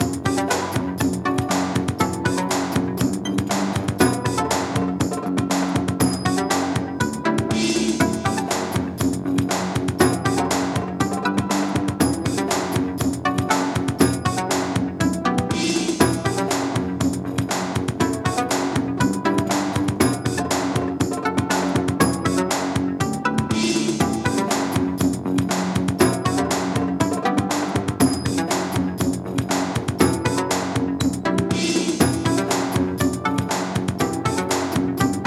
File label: desert loops, Loopable Lo-Fi version of a previously released track